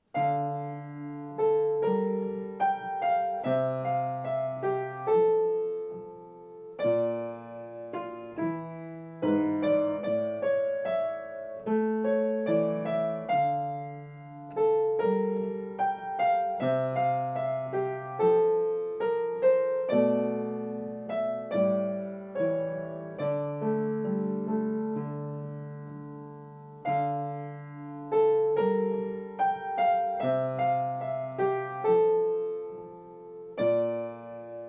Game Over

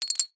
Browse Skin; subway surfers city sound effects

